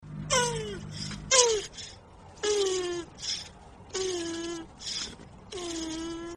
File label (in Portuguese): tartaruga ahhhh